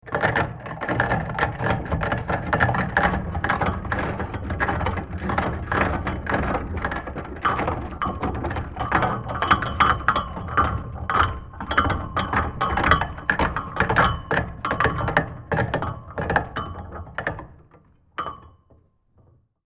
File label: Draw Bridge Chain Metallic Clanking; Medieval; Creaking